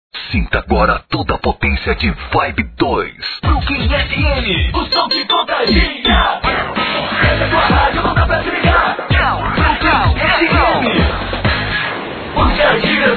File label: vibe